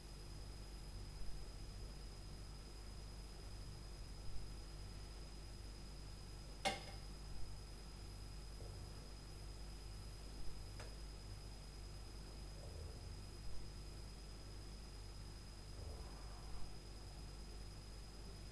Fridge + Sleep; Night Noise

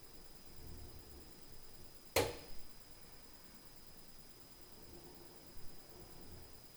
Sleep, Night Noise

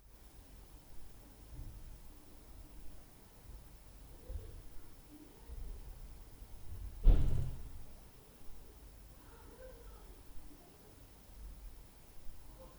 Upstair Booum, Night Noise

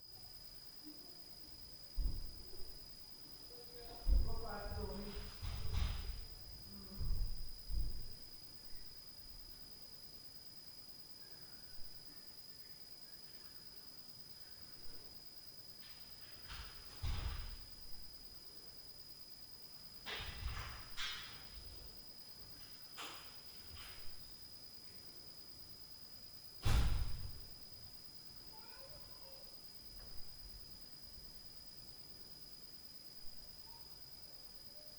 SPEAKERS + DOOR NOISE, Night Noise

